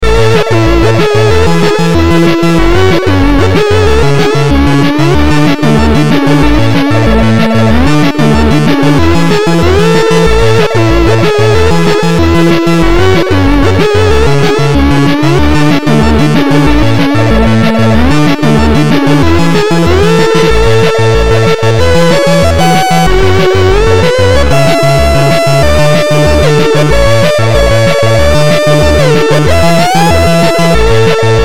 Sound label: music; svencoop